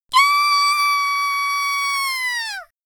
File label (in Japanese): kyaaaaaaaa